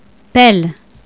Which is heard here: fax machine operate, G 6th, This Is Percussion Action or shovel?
shovel